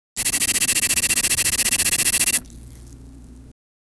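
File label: drip and splatter of thick, liquids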